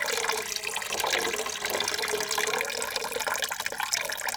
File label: waterspill; Rust